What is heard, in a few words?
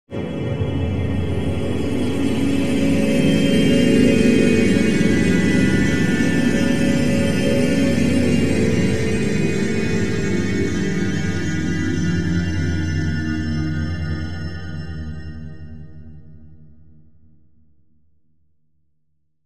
Opening Jingle